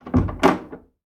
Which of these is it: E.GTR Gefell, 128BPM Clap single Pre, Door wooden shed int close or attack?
Door wooden shed int close